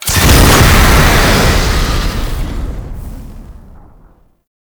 Tank Spray Start; Enemies; Weapons; Effervon; Warframe; Lasrian; LasrianTank

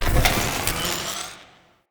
Galvanic Zapper Warm Up

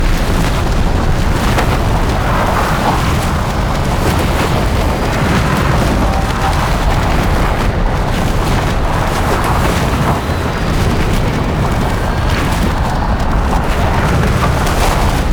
Deployment Cannon Loading Card Loop; Warframe; Hub; Tau

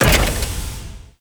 Powerplant Jettison Door Start; Gameplay; Warframe; Corpus Railjack; Tether Power Plant; Ambience

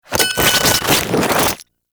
Grenade Prep; Warframe; Lasrian Soldiers; Weapons; Lasrian Goonadier Grenade

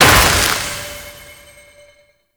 Shield Crack Large; Vehicles; Abilities; Thano Mech; Warframe; Nechro Mech

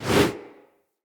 Generic Weapon Aim Transition In

